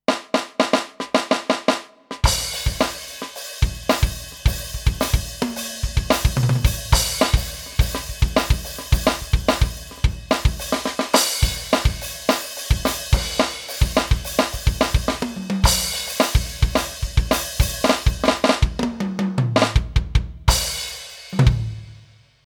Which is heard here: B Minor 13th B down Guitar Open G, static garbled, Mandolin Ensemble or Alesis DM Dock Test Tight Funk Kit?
Alesis DM Dock Test Tight Funk Kit